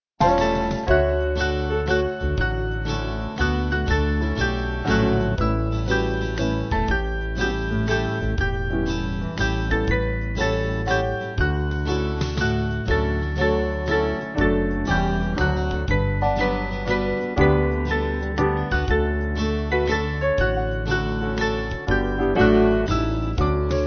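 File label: Band, Music Backing Files for Churches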